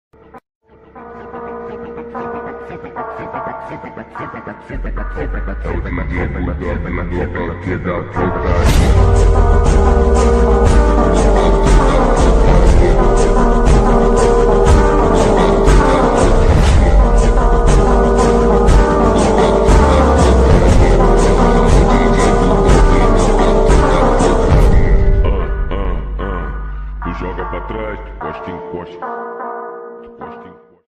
phonk ringtone

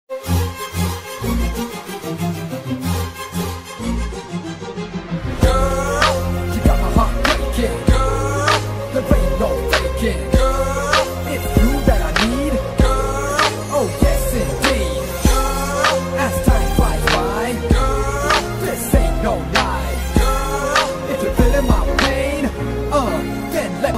love feeling bgm

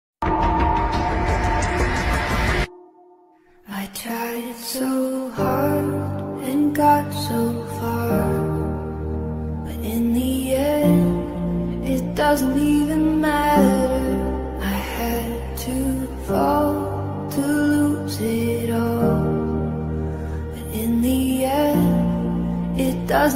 sad english ringtone

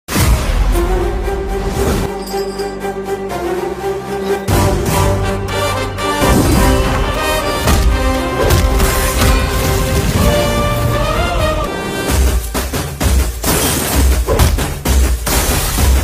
trailer bgm ringtone; walter veerayya

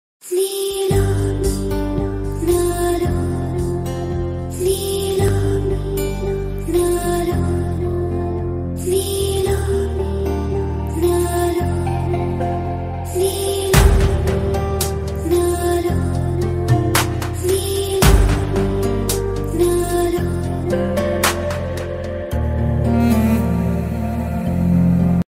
movie ringtone song bgm; Kondapolam

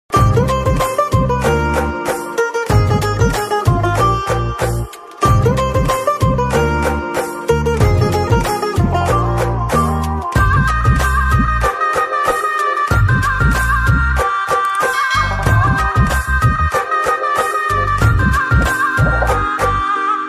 love bgm movie bgm telugu bgm ringtone; alludu adhurs movie

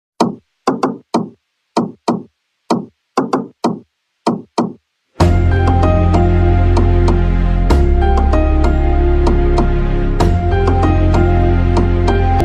sad bgm ringtone cover

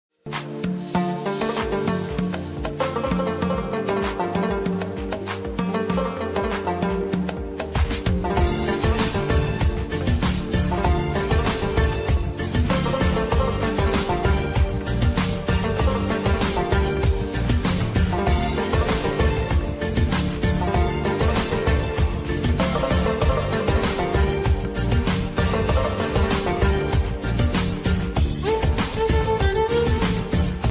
islamic instrumental ringtone; eid mubarak

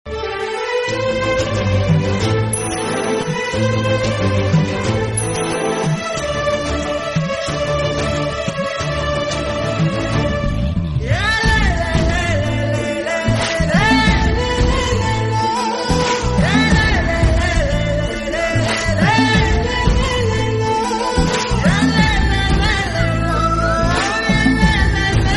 Flute Bgm Instrumental bgm; Sir

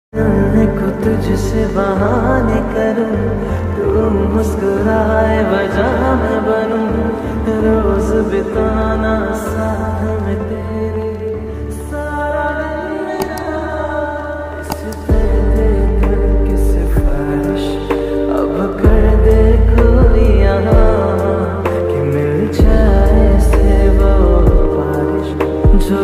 lofi ringtone movie ringtone; yaariyan movie

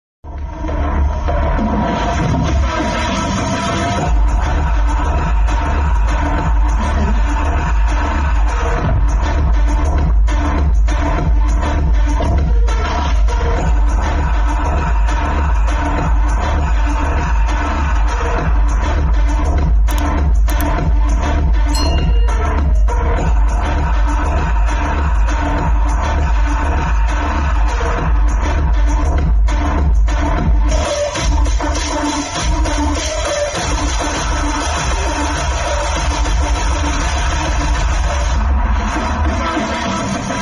amigos movie bgm ringtone

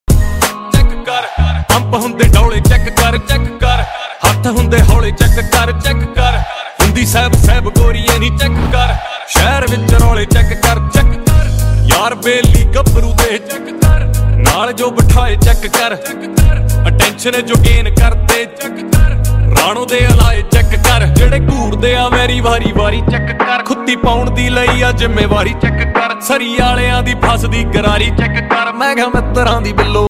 attitude ringtone

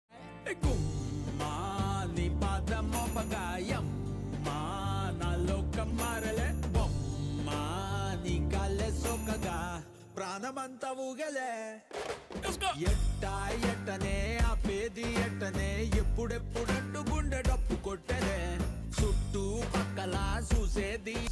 ambajipeta marriage band ringtone song; suhas

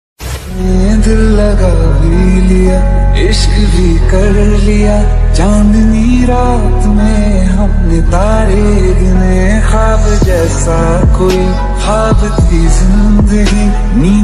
mobile ringtone

